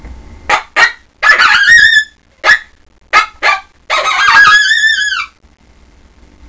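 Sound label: howl, coyote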